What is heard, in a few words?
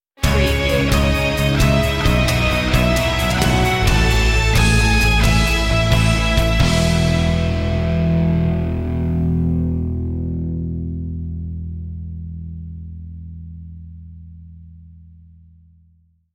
inspirational